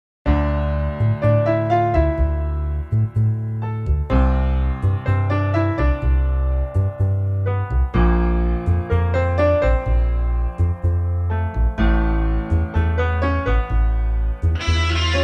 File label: Latin Blues; filmmusik; Paris